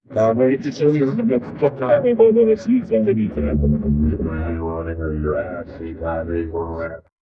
human toilet have a

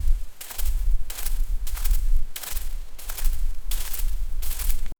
running fast on a grass

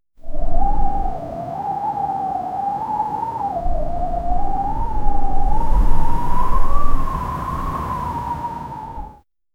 wind desert calm minimal

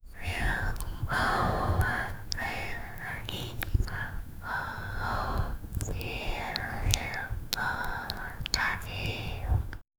faint whispering in the; human voices

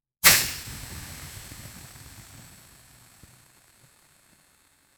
a fire work popping, fireworks

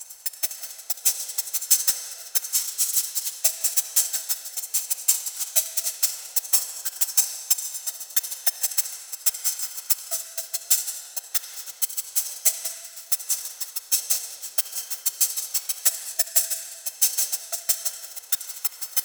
a rhytmic hypnotic loop o, analog

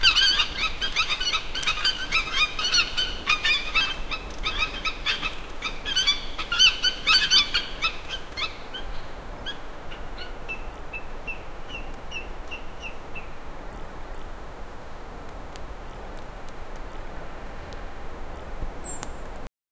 penguins and snow storm, blizzard

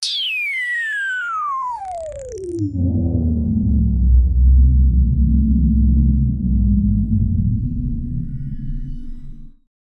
sound slowly losing fidel, glitch

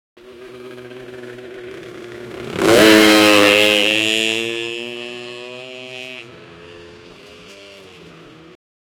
a retro sounding 125cc, motorbike

